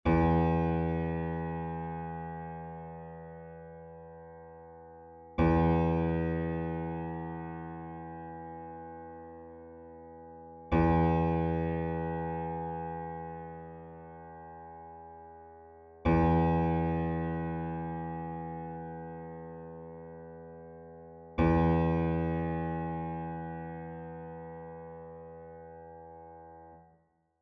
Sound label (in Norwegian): stemmetoner for gitar e2 strengen